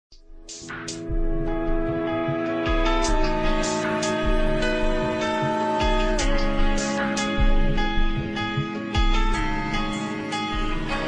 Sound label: dubstep remixed